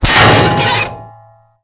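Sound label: metal pipe snap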